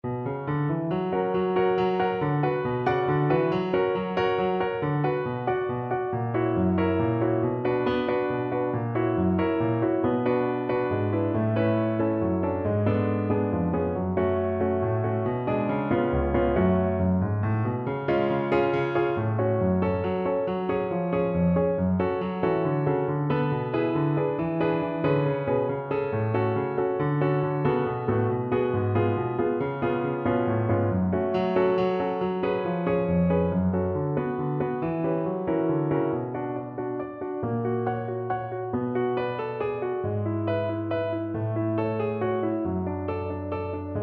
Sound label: accomp, b min